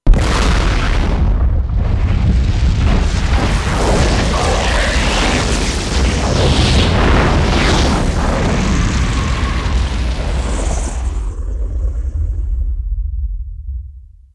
davy explosion, nuclear

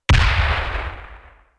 small explosion, light bomb; explosions

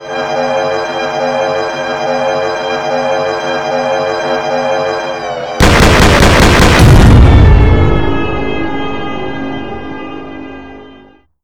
exothermic bomb

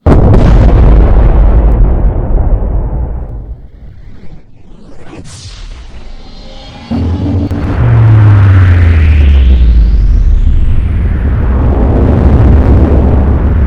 antimatter, explosions nuclear

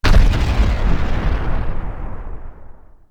explosion big, heavy bomb; explosions

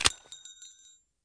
pin pull, grenades; weapons

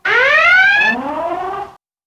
scifi siren; sirens

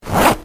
protection used

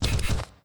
dirt, footsteps

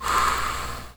exhale

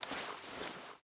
psg 1 draw, weapons; g3sg1

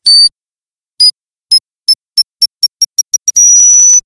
c4 timer; explosions; light bomb

